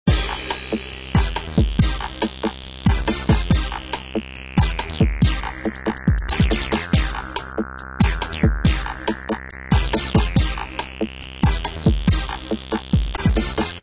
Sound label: simple Loop; aventura